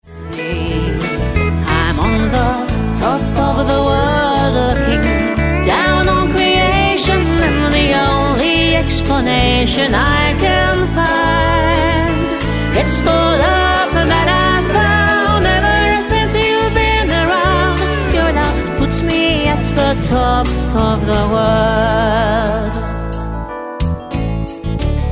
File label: country parlor